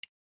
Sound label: number picker click; Oppo F3 Plus